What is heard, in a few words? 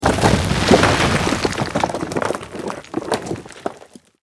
ore fall